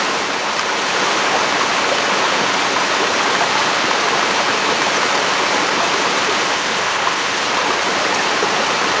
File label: ponds